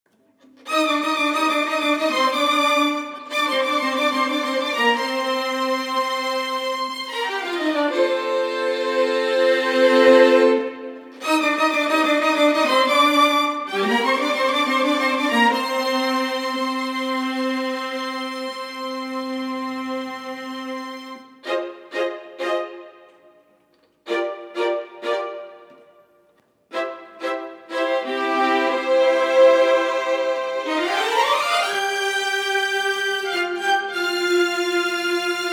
Motown Strings; Splice Sounds Originals

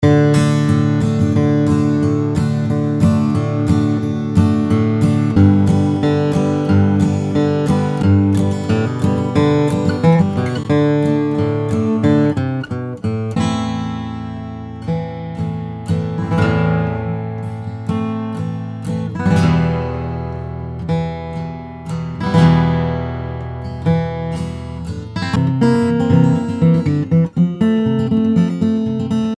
Country Acoustic, guitars; Soundtrack Loops

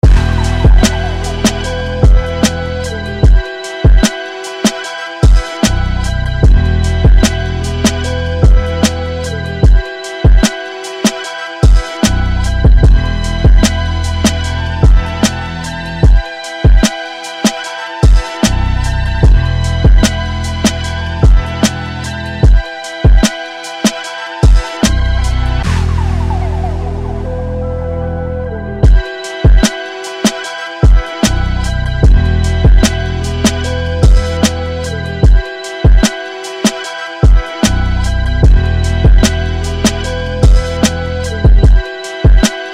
Boom-Bap; Jay Cactus; Drum Saga; The Ultimate Drum Kit Bundle

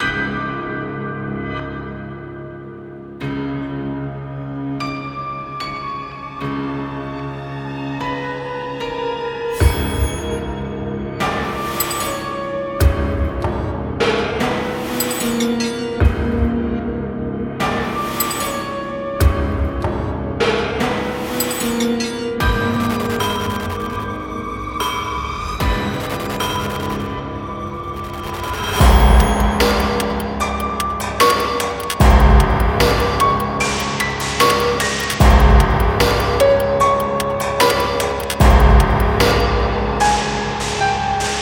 Hell Drums Horror; Cinetools

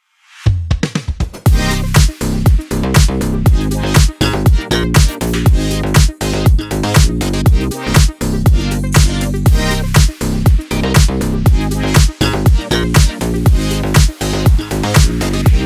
Astral, nu disco sound pack; dharma studio

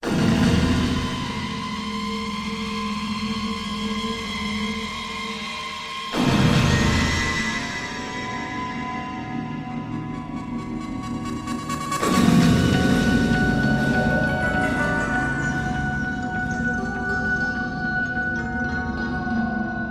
cinematic guitars; matiasmacsd; axmos

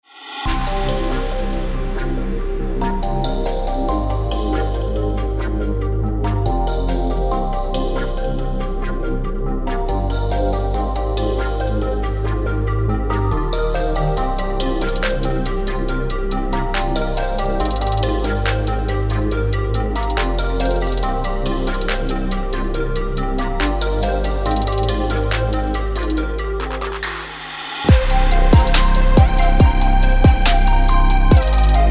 Wave Essentials And Chill Trap; Komorebi Audio

